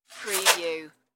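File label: Paper In Trash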